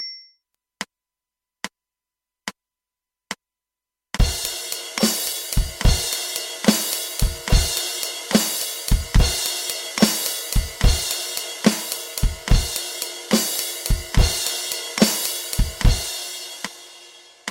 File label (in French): 12/8 rythme